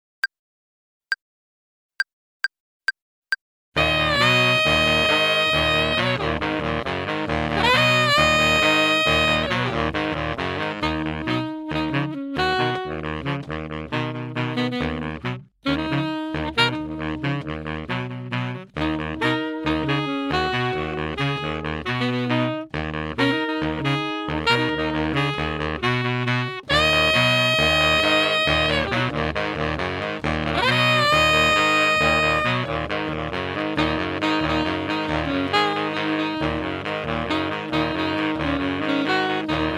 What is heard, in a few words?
playalong alto